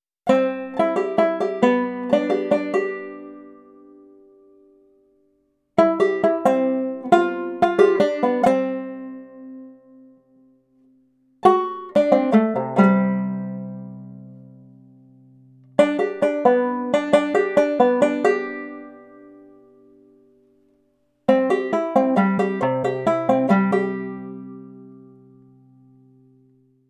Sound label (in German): Zupfmuster mit Triolen und punktierten Noten